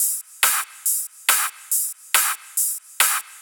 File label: Loops 140 BPM Hihat Percussion Loops